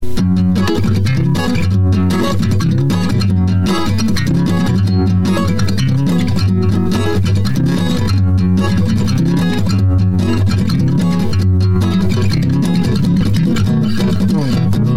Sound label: Guitar